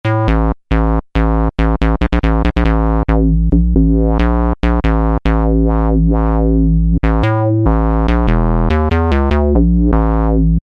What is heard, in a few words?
Korg microKORG Acid Ring Bass; Factory Voices